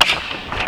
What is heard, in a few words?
MACHINES